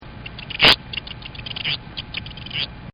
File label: KINGBIRD, bird; Birds; Animals; Partners In Rhyme